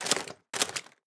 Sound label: Paladin Walk; Fargels; Gamigo